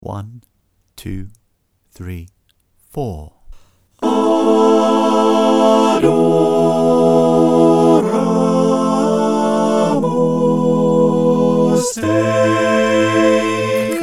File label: Adoramus Te - SATB